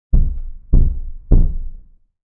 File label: Fs Triple Female, stairs